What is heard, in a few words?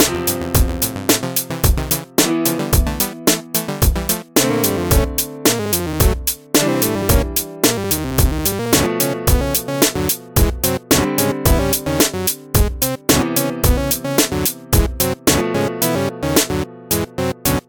Ringtone